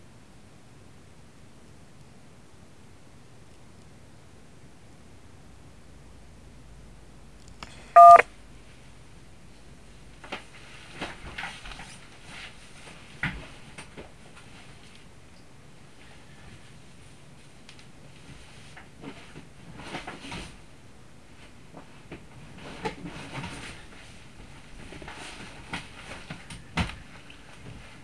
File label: digipeter on simplex; n0zyc; radio; foxhunting; stuck transmitters